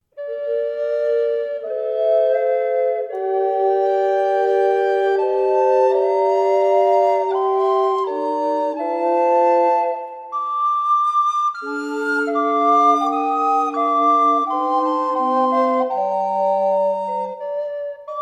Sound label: Sweet was the Song SATB